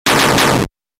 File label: crit Attack